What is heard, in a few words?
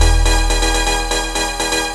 Chime Bells - 12B, Jungle; Blu Mar Ten - Jungle Jungle - 1989 to 1999 Samplepack